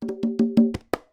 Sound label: Fill, Conga; musicradar